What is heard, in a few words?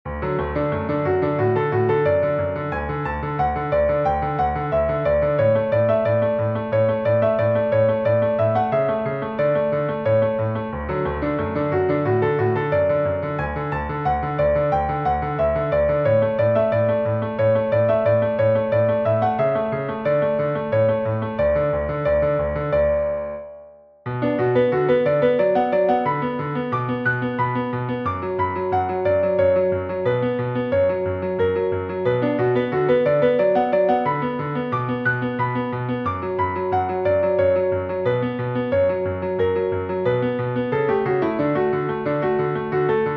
Piano